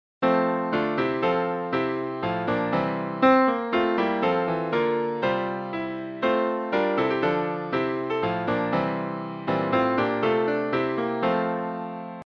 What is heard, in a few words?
Hymns